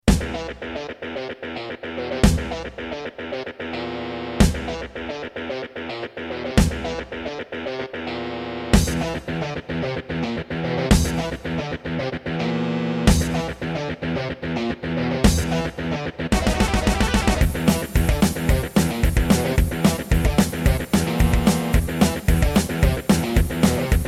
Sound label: Yamaha MU2000EX GSMODE; Doom